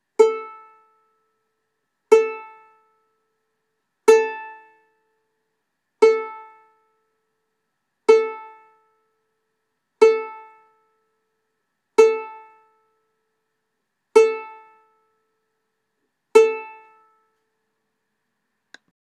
a note 4th string

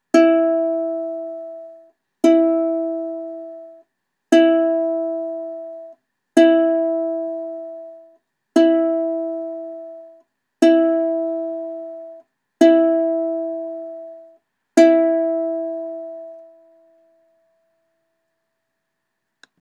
e note 3rd string

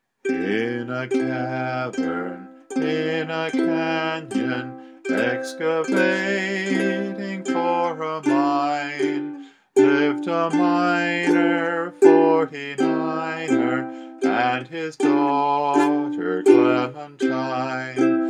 clementine sing and strum

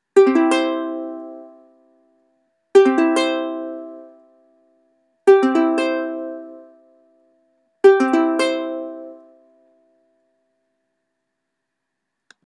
c chord

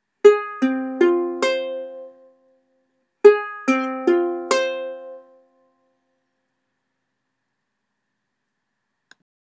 fm chord

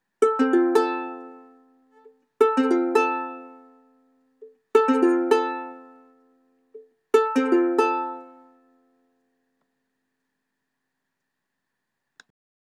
f chord

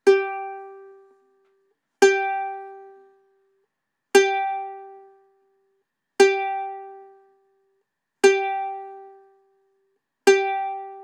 g note 1st string

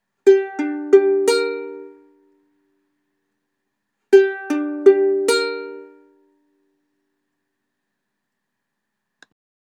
gm chord

